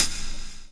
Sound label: HH HALL; E-Lab; X Static Goldmine 2; akai